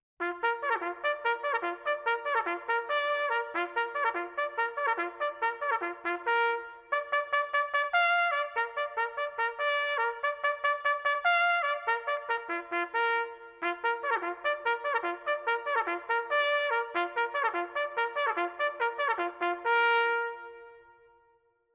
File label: Reveille Low Volume; D Troop,14th Armored Cavalry Regiment — Fulda, Germany; salute the flag as it was raised at Reveille